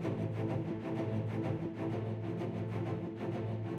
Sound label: free spin intro idle loop; goldentavern